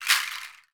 Pod Rattle; percussion; Cymatics - Infinity Beta Pack 2.0; Zeus Percussion Collection

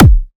Riddim Kick

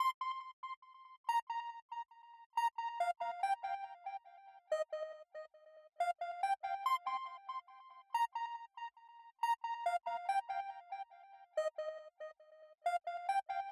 Happy Melody Loop - 140 BPM C Min Lead; Melodies; Loop Stems; Cymatics; Oracle Sample Pack; Happy Melody Loop 2

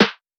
Snare - G#, drum one shots; Snares; Cymatics; Eternity Sample Pack

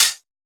Open Hi Hat, Dubstep Cymbals; One-Shots; Drums; Ghosthack; Ghosthack - AC2024 - Day 13 - Dubstep Sounds 2025

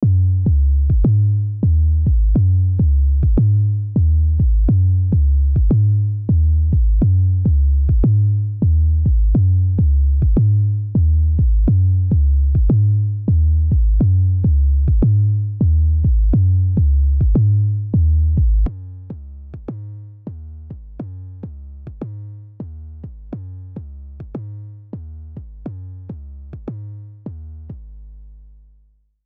103 BPM F# Maj Bass, Afrobeats; Melody; Loop Stems; Cymatics; Bahamas

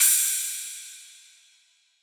Crash; Cymatics; House Starter Pack; Cymbals

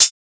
Closed Hihat; Ghosthack - Free Techno Drum Shots 2024

